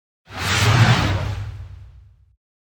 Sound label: spell duration end; baldursgate